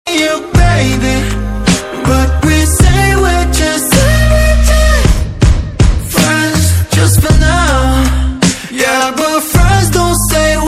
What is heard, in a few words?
English